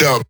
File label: vocal shot d#; MINDMINE